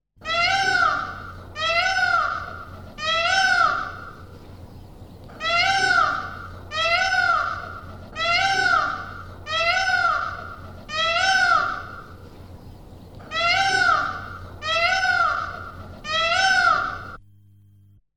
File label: Peacock; SOUND EFFECTS1